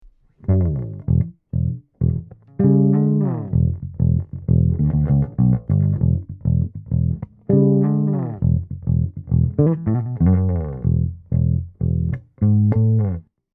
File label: tf47 Bass Amp; telefunken